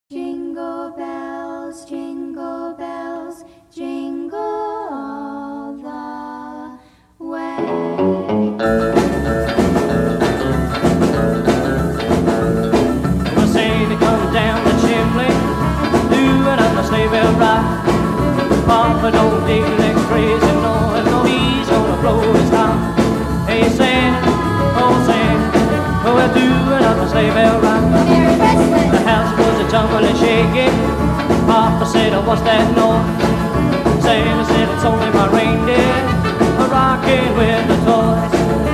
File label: Sleigh Bell Rock